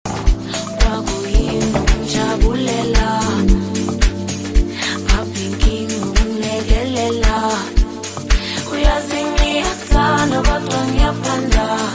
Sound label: Amapiano